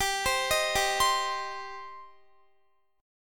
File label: G Suspended 4th Sharp 5th, Guitar; Gsus4#5 arpeggiated; x,22,22,20,19,19